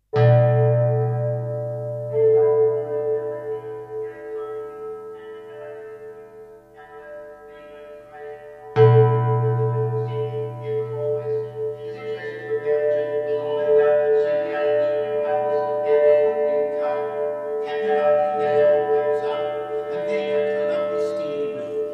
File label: big bell; Patrick Moore